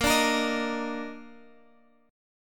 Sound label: Bb Major Add 11th, Bb